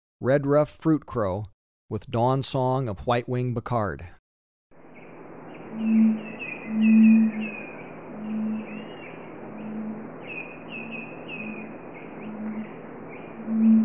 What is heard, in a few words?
Red-ruffed Fruitcrow, Birds of Ecuador; S. America